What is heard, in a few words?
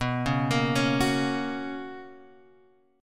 B Major 7th Suspended 2nd Guitar Open G, chord